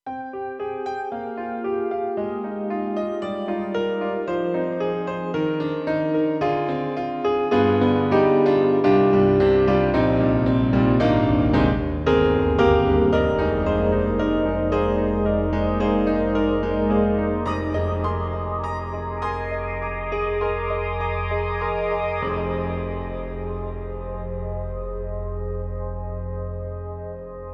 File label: KORG M3 XPanded Combination Tirtian Piano